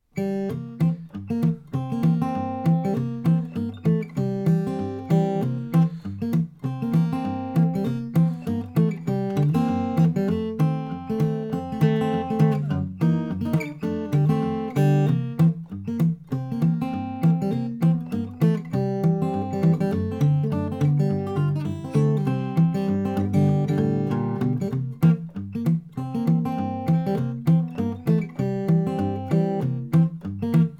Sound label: Picking